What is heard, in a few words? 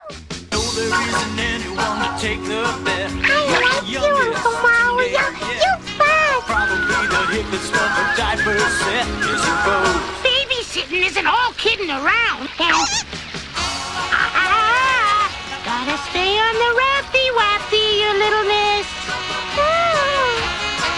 Short music sample of a cover song